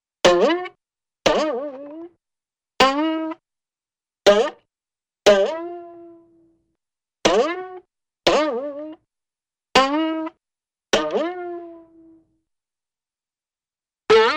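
Several Boings with various Decay Lengths, Cartoon; Hollywood Edge; Cartoon Trax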